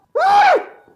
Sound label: pillow scream